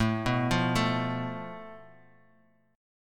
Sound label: A Suspended 2nd Sharp 5th, Guitar, Standard; Asus2#5; arpeggiated; 5,2,3,2,x,x; JGuitar